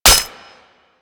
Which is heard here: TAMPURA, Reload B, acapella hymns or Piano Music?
Reload B